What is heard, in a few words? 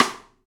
Folk Stick, Rimshots; Roland BK-5